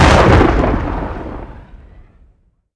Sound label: lg fire start; cstrike; Quake3